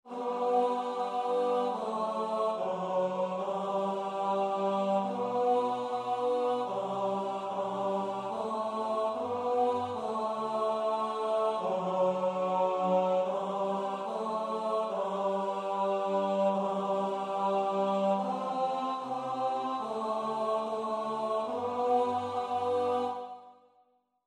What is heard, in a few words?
Psalm tenor